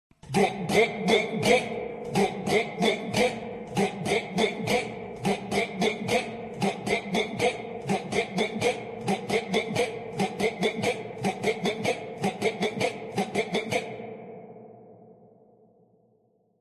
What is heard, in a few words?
gulp slow